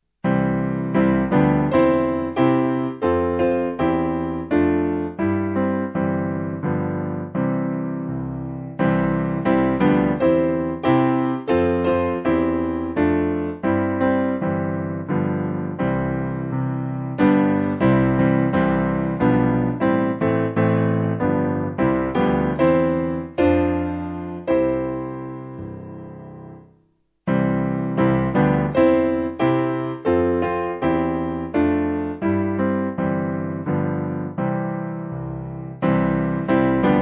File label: The Lords My, Piano; Br James